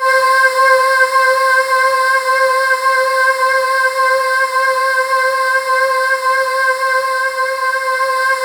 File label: C5, Synth Leads